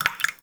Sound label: Water Drips; Ambience; Props; Warframe; TheNewWar; Zariman